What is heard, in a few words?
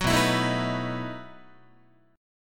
B 11th Guitar; strummed